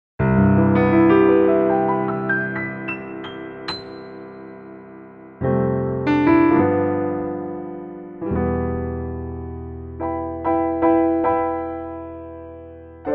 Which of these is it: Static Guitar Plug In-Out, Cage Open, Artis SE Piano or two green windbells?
Artis SE Piano